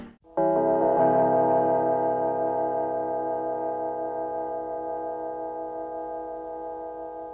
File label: Dark Gong